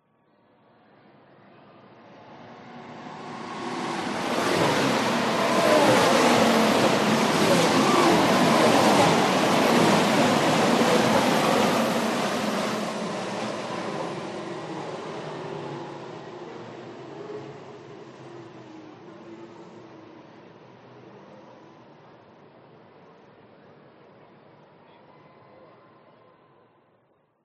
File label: f1 race track pass speed